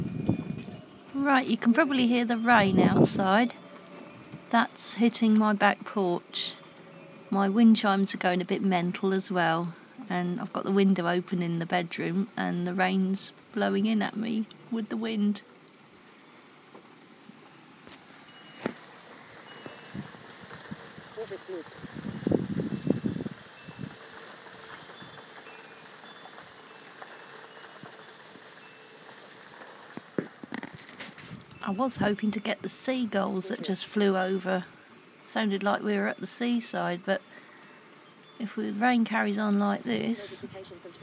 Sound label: rain and my wind chimes